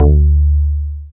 BAS FM DX-7

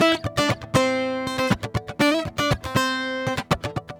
GUITAR SALSA

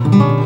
STL STRNG CRD; Best Service; World Colours

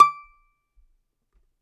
DOMRA, Prima Domra, Plucked Strings; E-MU Producer Series Vol. 7 – Old World Instruments

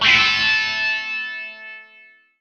DIRTY WAH, Distortion Gtr; PlatinumPhattCD2; E-MU Producer Series Vol. 8 – Platinum Phatt

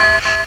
Beep; Meltdown

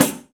Pandeiro; Roland BK-5; Percussion

